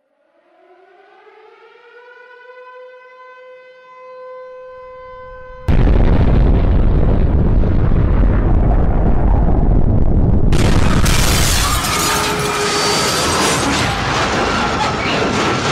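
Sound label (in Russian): сцена катастрофы ядерный взрыв; Звук взрыва от бомбы или снаряда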